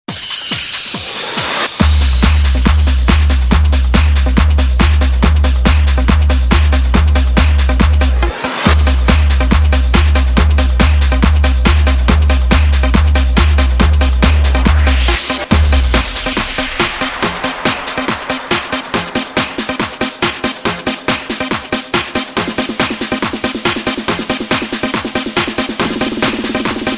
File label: Jumpstyle